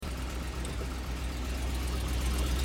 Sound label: quiet engine knock engine; discovery ii